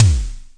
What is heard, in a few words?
Simmons SD-5, drum machines